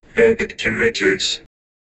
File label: time check stinger, broadcast